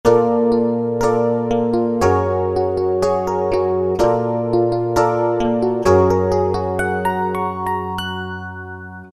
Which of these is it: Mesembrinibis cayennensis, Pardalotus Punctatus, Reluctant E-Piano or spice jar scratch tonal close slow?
Reluctant E-Piano